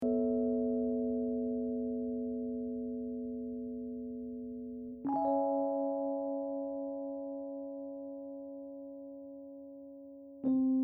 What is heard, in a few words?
RHODES